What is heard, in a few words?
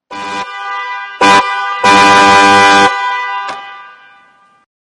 FIAMM AM80S TYPE